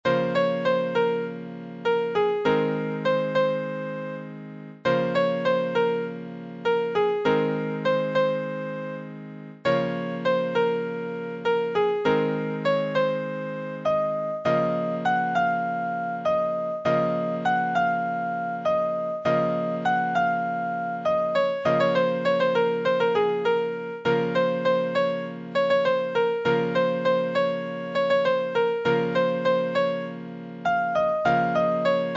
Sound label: Piano